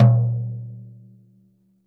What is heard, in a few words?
floor tom - snares off; JazzFunkKit